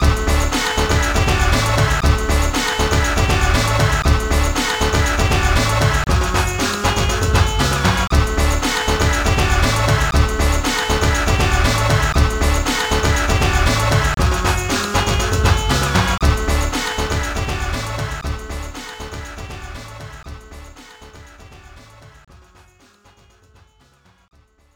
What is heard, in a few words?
Ending; data east s hoops; deco mlc system